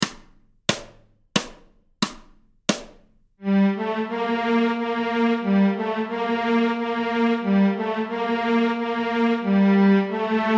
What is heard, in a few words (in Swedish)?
Stråk G moll, Nyckelharpa; Göingen; A-strängen Steg 1 Två toner i dur